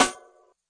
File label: classic hh Tmb Snr, Classic Hip Hop; STYLE KITS (Hip Hop, Etc); Classic Hip Hop Mega Kit